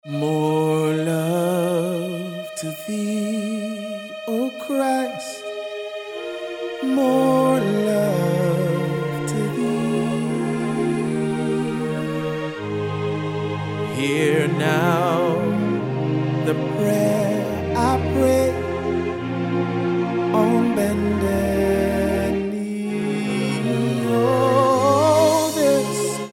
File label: Ringtone